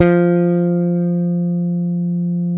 SPEC SUSTAIN, Bass

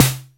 Msx Snare; Drum Hits; Snares; Nu Dance Electro; Zero-G; Soundclash

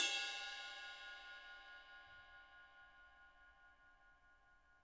RIDE, RIDE CYMBALS; Spectrasonics - Backbeat

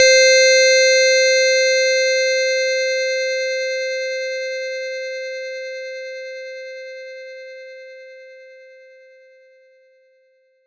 LED C5, Roland SH-09 synth lead; Leads and Keys

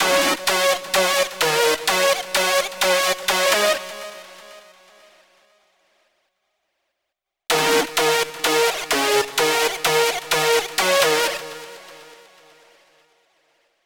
synth lead; Session 09 - Retro Fit

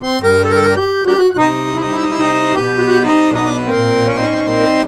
Balkanish Traditions; USB Soundscan vol.63; AKAI

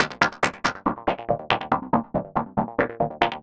synth 140 blippy C Min; Transmission-X

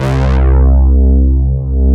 JX-10P, JAP SYNTH; East West - Global Sounds

